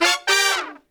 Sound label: BRAZ LICK, BRASS LICKS